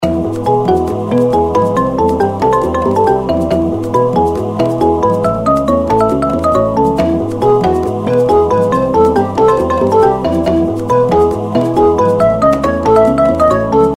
intro bgm; Africa Run

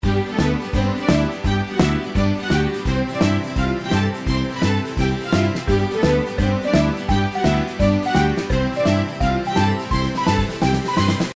intro bgm; Jelly Mania

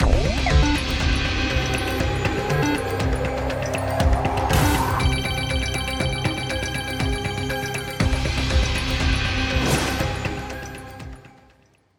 bigwin horror; Great Book Of Magic; Wazdan

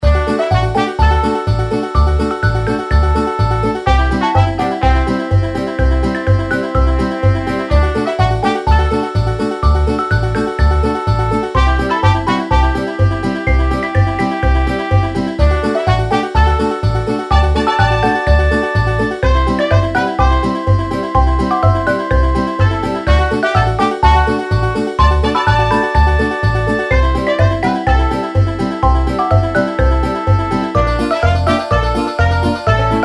reelspin bg; Cocorico

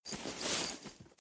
gamble draw; games; Corrida Romance; Wazdan

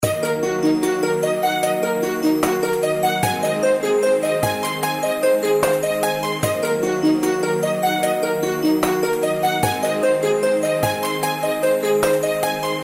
snow leopards outro bgm

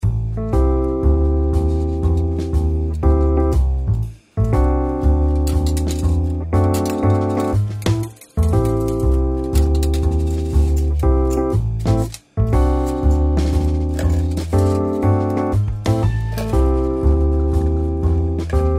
reelspin bg; Flaming 7s

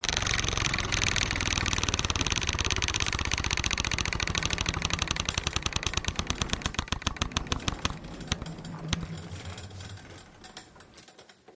piramid spin wheel; The Golden City

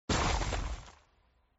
buff ice; Thunder Fighter

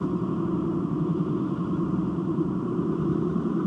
spin; In The Forest; Wazdan

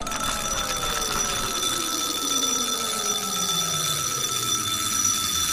near miss; Fishing For Gold

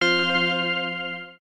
autoplay off; All Ways Joker

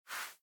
slide change; games; Demon Jack 27; Wazdan

